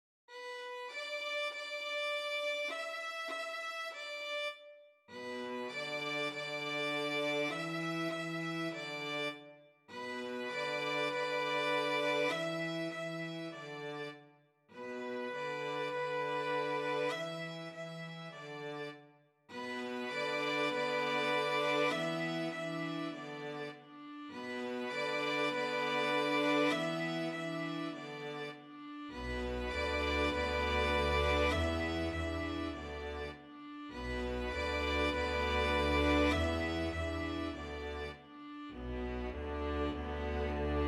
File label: string quintet